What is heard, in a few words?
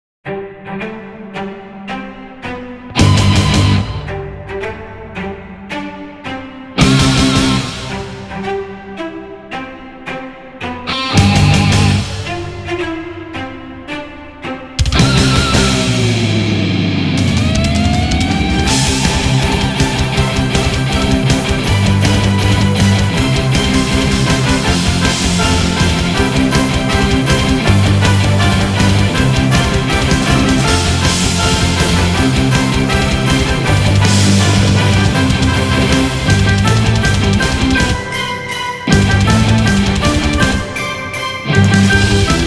Happy Birthday Heavy Metal Version